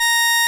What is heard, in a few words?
SOLINA, String Machines